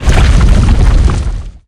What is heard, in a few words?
Rock Platform Move